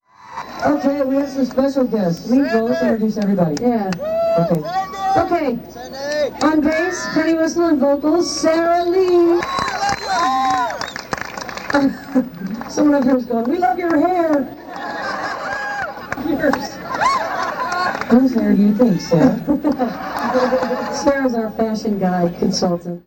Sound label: crowd talk we love your hair; new brunswick nj 1995